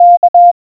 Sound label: morse K